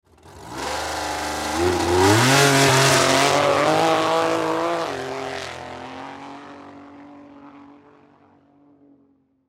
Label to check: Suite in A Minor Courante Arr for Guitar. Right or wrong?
Wrong